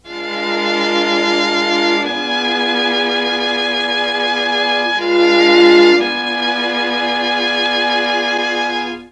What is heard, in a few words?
C#3, Mellotron; Mike Pinder - Mellotron Archive CD